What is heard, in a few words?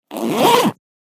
zipper closing; everyday objects